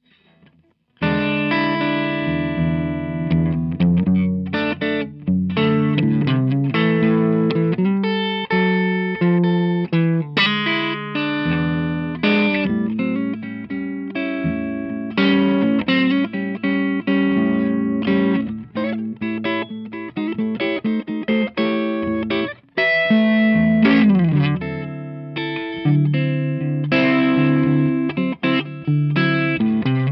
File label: Strat Crunch